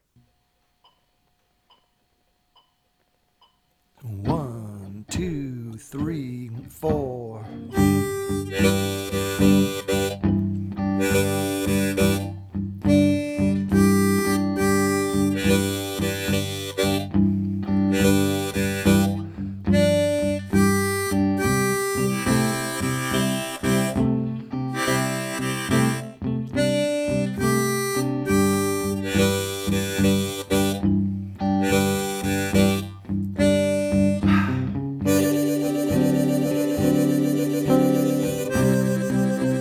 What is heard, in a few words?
Bird Instrumental, 70bpm